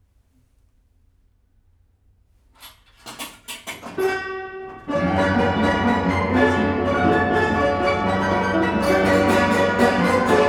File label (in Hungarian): fa szekrénybe tett belga gépezet, verkli; 1899